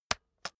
keyboard external space hit slow

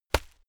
hit plastic fleshy hard

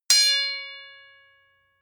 sword impact metal ring heavy

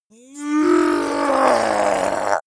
zombie human change

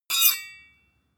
sword blade grind

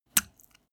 step barefoot wet hard

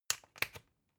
usb port metallic in hard

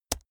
keyboard laptop space push hard

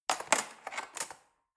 cutlery fork drawer handle scrape

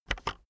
keyboard external enter press fast

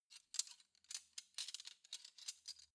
cutlery spoons handle soft

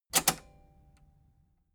metal button robust spring click enable fast

